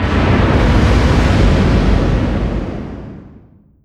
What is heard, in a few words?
distance ship miss big effect; Hearts of Iron IV Death or Dishonor